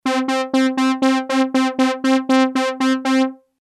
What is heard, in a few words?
lfo env; nord3